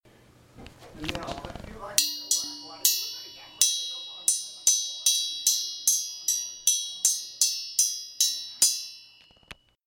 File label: tone ring, banjo